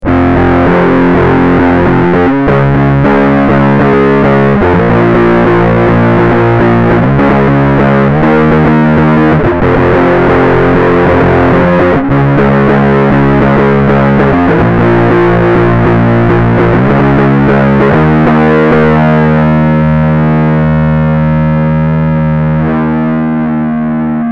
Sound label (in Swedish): gitarr